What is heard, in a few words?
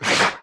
alarm attack